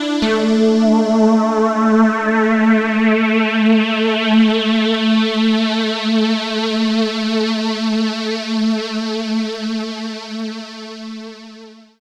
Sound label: Sustained Lead, EDM; Sonic Foundry (Sony Creative Software); Afterhours EDM; Retro Active